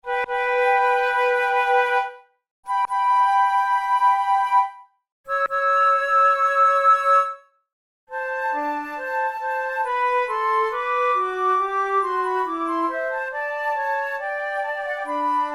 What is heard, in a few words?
fantasia per flauto solo in g major larghetto; mercadante